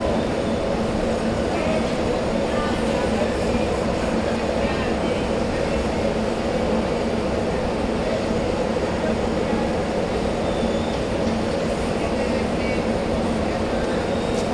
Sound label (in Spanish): cristaleria vitrofin; canada de gomez